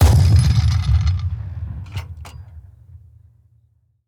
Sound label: tools tankgun; teardown; ps5 windows xbox series xs